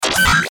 Glitch Login Error, Glitch Harsh Warble Laser Static; Production Element Imaging Element Accent Transition